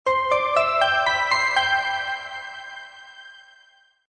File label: Power On; Maxvi; B1